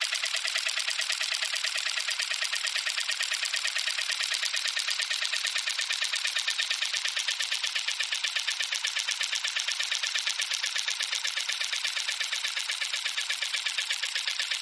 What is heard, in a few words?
Motor, Small; Electric Toothbrush, Slower and Slower Into Loop